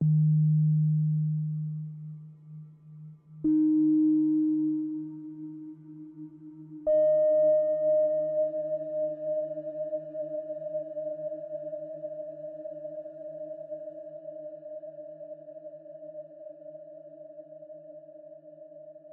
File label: C Note Sines Reverb; Loki